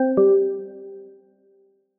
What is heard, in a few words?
alert; insiteful; sonic branding ux